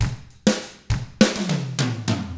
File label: ROCK, Drums; ILIO; Double Platinum Drums 1